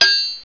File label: bolt bnc